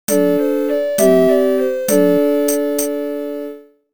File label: Faire (Jingle); thats so raven psychic on the scene